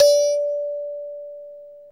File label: KALIMBA E3; ETHNICSTUFF